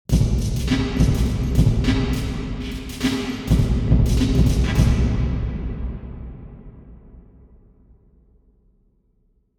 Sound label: drum, nuclear reactor hall; omni